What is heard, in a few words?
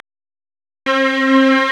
STRNG; CMP - Analogon